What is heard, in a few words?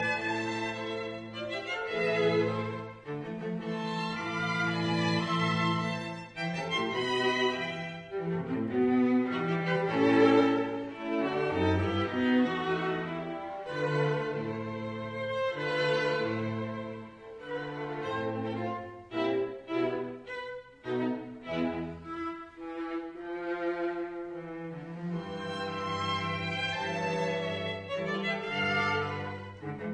String Quartet in A minor, Quasi minuetto Moderato; Brahms; Op 51 No 2